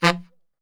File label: F 1 SAX SHOT